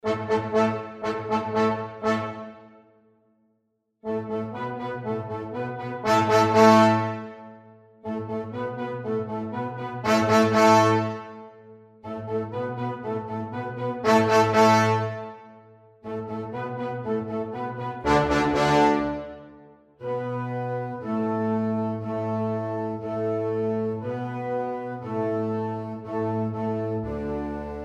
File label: Clapping Polka